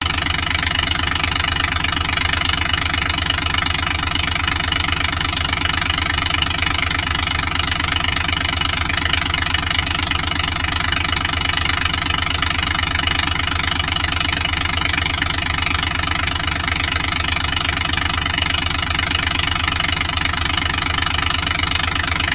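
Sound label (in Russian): звук цикла шума производимого дизельным двигателем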